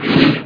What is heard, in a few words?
Woosh; knights try